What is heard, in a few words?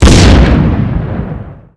blaster explosion